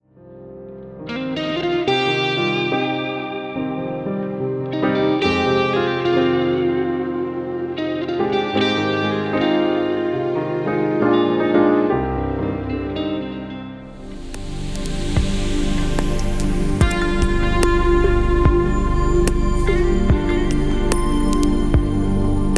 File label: Epiphone Nighthawk